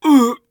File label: hit; Escape from Tarkov; scav5